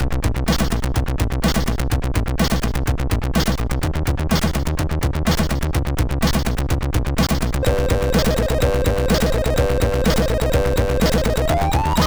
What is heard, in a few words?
Techno, sid MOS6581R2